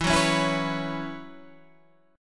Gb 7th Suspended 4th E, chord

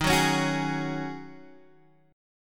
Eb Minor 11th Eb down Guitar Half Step Down

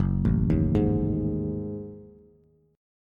Gb Minor 6th Add 9th Gb down Bass; arpeggiated; JGuitar

